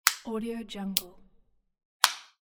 plastic buttons

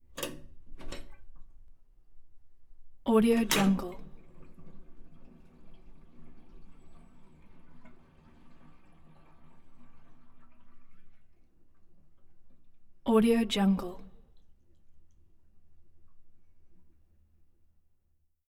bathtub drain lever

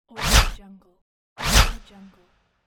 swoosh

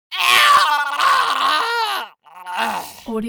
human vocal male die gurgling

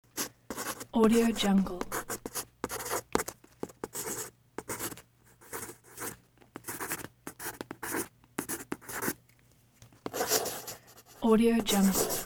pencil

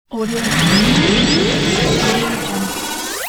tech gear

